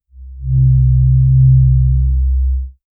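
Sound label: deep double bass, string instrument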